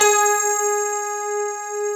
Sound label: GRAND PF G 3, AKAI S-Series; AKAI S-Series CD-ROM Sound Library VOL-7; D.GRAND PF